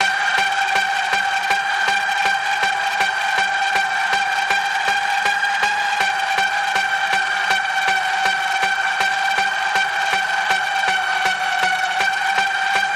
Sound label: Paranormal Panning Pulse G, Key of G